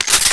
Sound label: mp5 clip out; svencoop